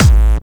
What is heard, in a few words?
Distortion Kicks, Bassdrums